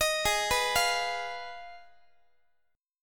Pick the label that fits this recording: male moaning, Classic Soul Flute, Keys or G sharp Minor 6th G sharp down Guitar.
G sharp Minor 6th G sharp down Guitar